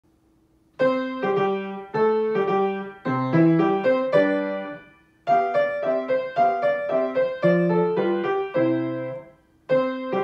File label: march marsch